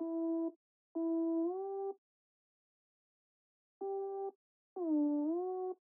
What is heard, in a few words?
bass; Massive4